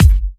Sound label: Kicks; Vengeance Samples